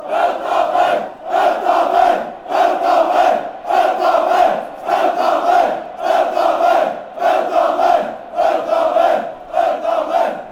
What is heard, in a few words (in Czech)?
pelta ven, chants